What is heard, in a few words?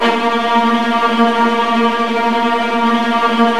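viola tremolo A#1 left, Virtuoso Strings; ILIO; Kirk Hunter Virtuoso Strings VOL-2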